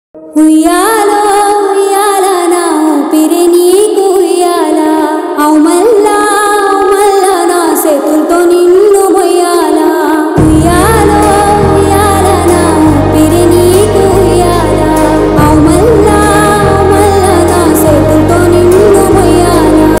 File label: female telugu ringtone